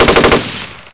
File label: M249 auto; SpecOps2 MPlayer Demo